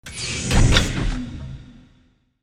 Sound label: Elevator Begin